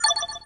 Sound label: Bell